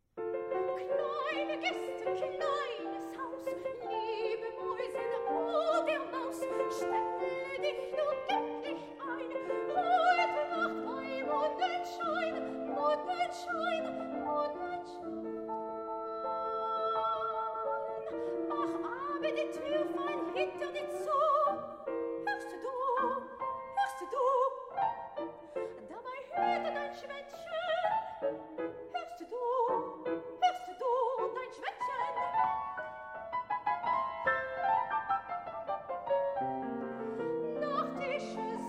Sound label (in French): 6 lieder pour voix de femme; Wolf